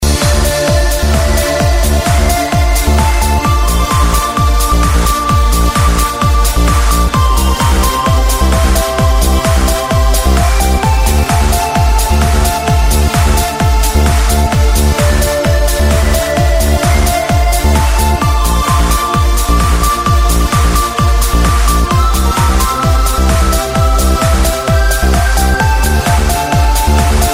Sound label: beautiful flute